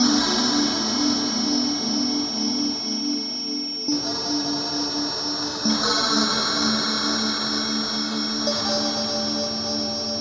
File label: Spectral Tones; Sonic Foundry (Sony Creative Software); Loop Noir